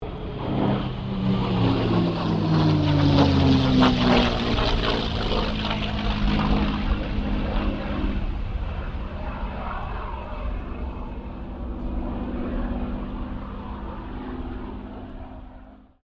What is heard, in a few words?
AIRCRAFT CA-18 MUSTANG PASS